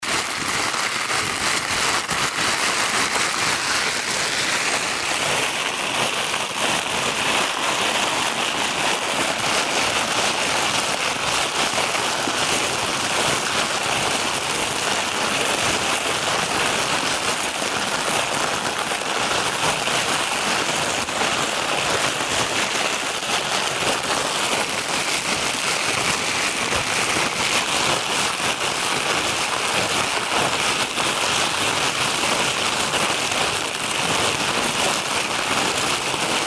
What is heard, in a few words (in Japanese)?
袋ガサガサの音